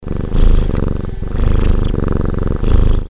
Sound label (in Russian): koshka murlychet